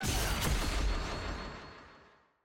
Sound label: map click; naafiri